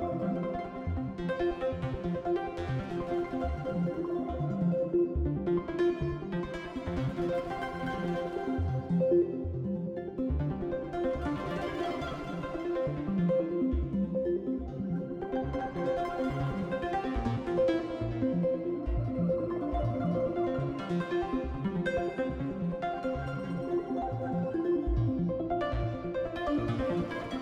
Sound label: good flux arps; freeflight